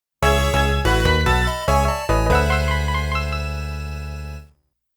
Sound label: jingle